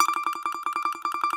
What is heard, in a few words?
BAGLAMA T1DR; E-MU Producer Series Vol. 7 – Old World Instruments